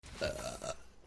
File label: burp